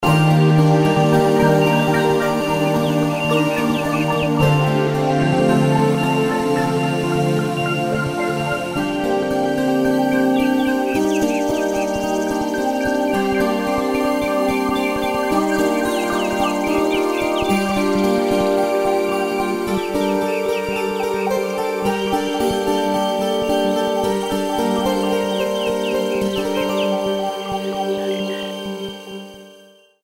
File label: Far Away Forest